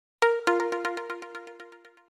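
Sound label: spiral, huawei harmonyos sounds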